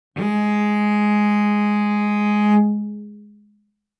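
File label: Cello arco fortissimo sul D Ab4; MIS Pitches - 2014; Strings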